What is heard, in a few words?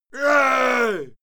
male fight scream; schreie; menschen; soundpool